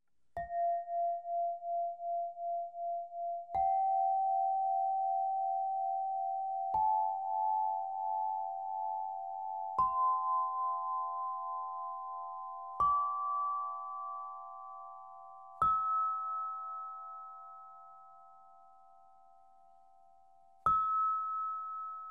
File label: Light Crystal Harp Notes 432Hz; Lekka harfa krysztalowa; Czakra serca F